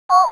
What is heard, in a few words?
crossbills toop